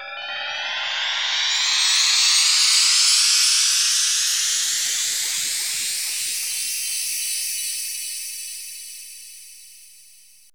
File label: BELL ARP; AMG - Vince Clarke Lucky Bastard